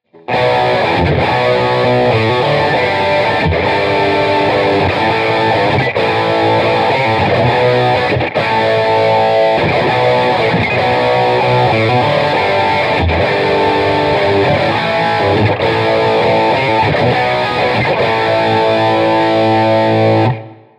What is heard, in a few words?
Amplitube Max Rhythm Sound